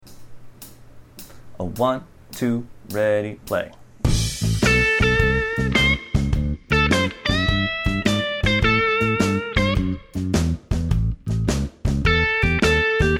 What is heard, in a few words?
4 note solo, blues guitar